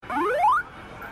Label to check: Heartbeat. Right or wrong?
Wrong